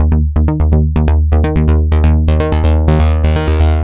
Tech Bass; Sonic Foundry (Sony Creative Software); Underground UK House & Electro

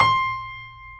PNO, Piano; AKAI S6000 CD-ROM - Volume 1; CS PIANO

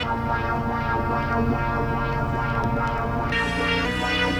Ambient; Sonic Foundry; Sony Creative Software; Stylus Pressure Urban Grooves on Digital Wax; Nu Tang

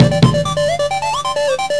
korg stuff; Urban Shakedown

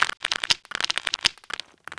Crunchy, Beats

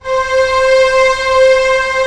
STR C3 PP, Orchestra; Sound On Sound; Peter Siedleczek's Orchestra

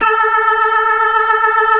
ORGAN, 4 DRAWBARS; Keith Emerson Signature Series

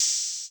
hi-hat, Millenium MPS-400

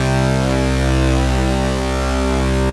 P5 DIST; AMG - Global Trance Mission VOL-2; SYNTH

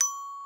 GLCKN

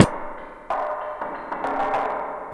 99 bpm, break; ONE HAND; 90 s Sample CDs; Planet of the Breaks

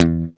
GTR Funky Strat, Funk & Mute; Club-50 - Foundations

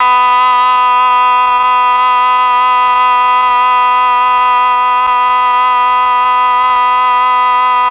MEL OBOE

